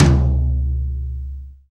Long Tail Reverb Tom Drum Sound C# Key, Royality free tom sample tuned to the C# note